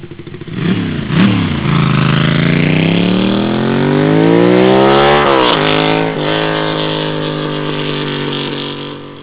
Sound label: duc strt, Ducati